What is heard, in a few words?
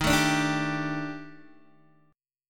Eb Major 7th Flat 5th, Guitar Open D